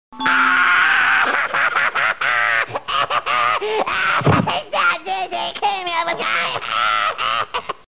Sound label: Cartman laugh